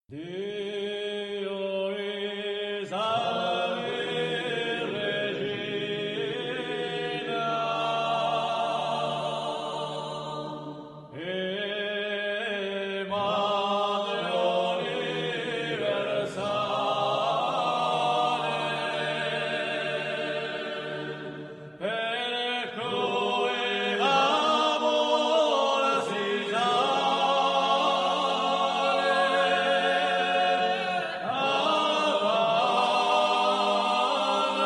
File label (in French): les chants polyphoniques